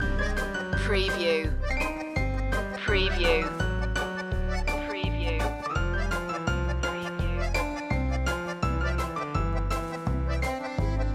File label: circus tango loop